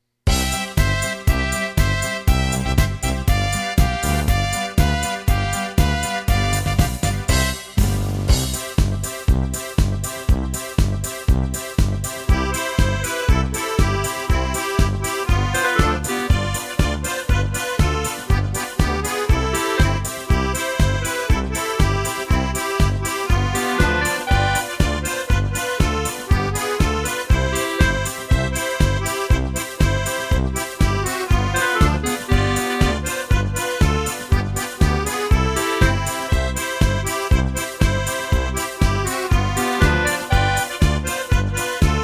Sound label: Polka